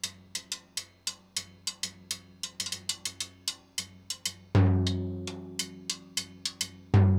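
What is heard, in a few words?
timbale cow, latin, 100bpm; Sound Effect Library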